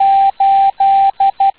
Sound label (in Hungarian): Távíró 8